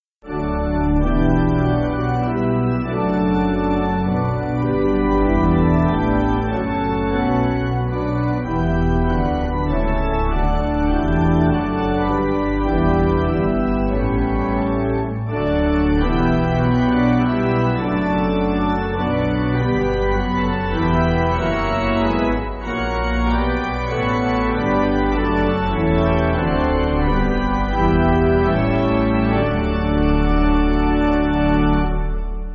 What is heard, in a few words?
Pipe